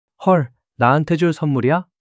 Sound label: surprise